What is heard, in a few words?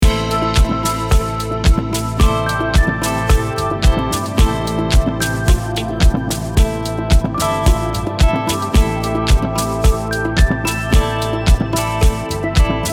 110BPM